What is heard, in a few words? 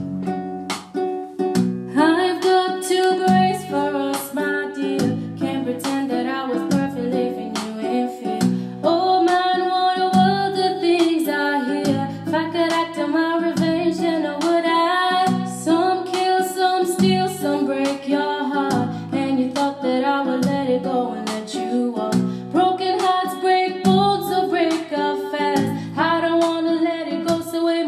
Vocals